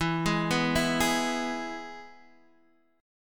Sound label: E Major guitar chord, Half Step Down